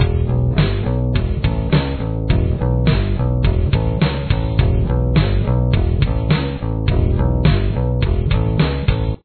main bass; Remember that the bass part below (and the other 2 bass parts to follow) are all repeated for the other parts of the song.

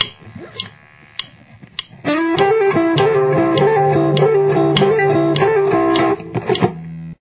outro

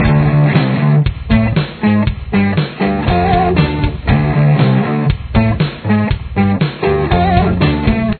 riff, guitar

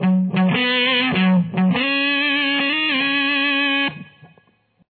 lick, guitar

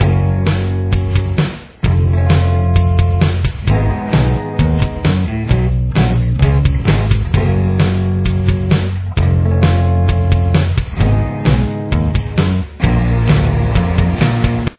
chorus, guitar

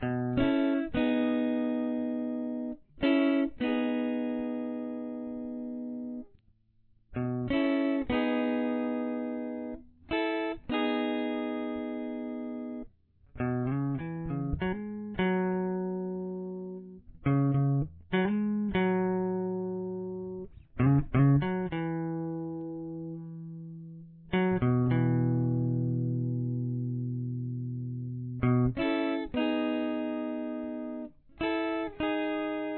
guitar

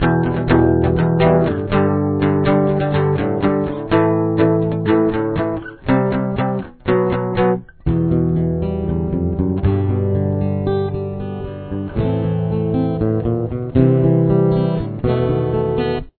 full verse, guitar

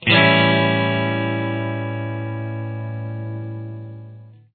a dominant 7th open, guitar

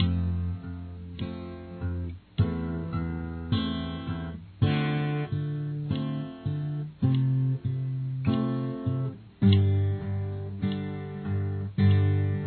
chorus slow

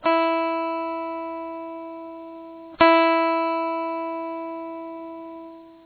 string high e, guitar

